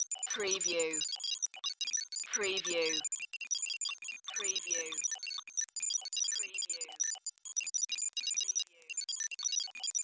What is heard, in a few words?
Control Panel Beeps